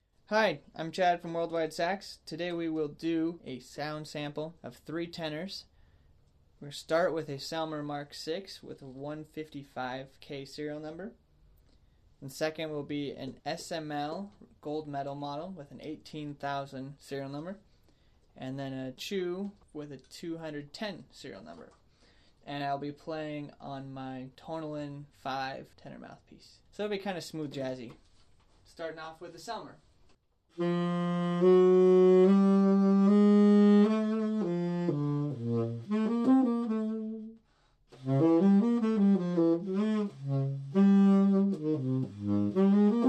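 Tenors Selmer VI SML gold medal Nickel Chu, tenor saxophone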